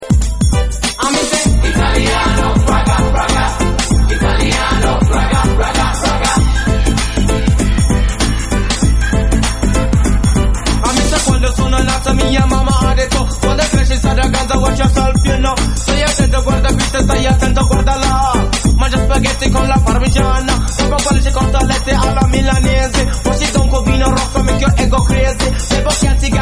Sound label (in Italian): RAGGA